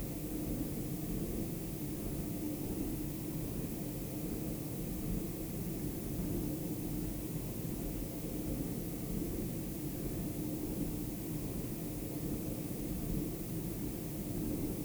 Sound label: Biome Noise Loop - Hydrothermal Wastes; Music